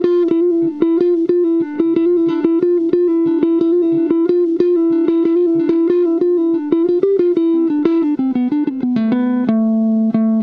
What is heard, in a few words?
Electric Gtr